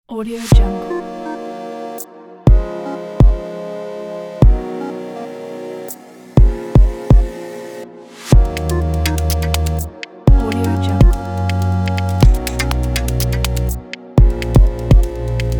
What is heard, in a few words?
minimal technology glith